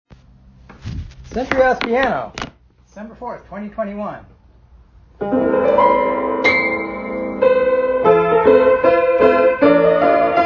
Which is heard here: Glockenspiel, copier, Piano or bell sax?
Piano